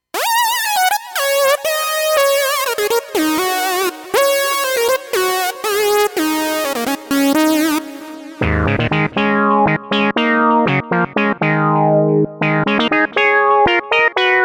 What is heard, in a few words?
Leads; Minimax; dinopark